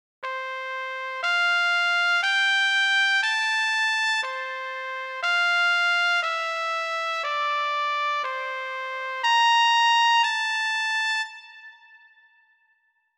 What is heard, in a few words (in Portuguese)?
Ditado de sons Fa Maior trompete